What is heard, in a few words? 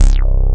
BASS, JV-1080